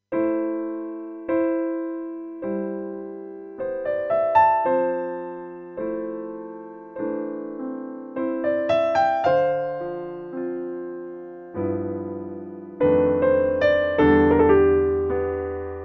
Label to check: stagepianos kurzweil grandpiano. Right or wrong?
Right